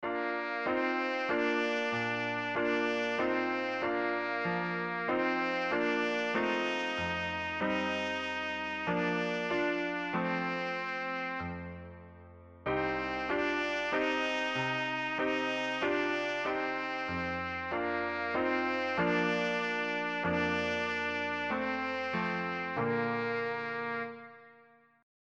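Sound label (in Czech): doliny trubka klavir, trumpet piano; česká národní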